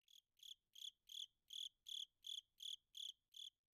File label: cricket